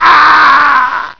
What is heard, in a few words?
PAIN; WEAPONS